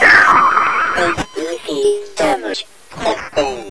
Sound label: pain, zombie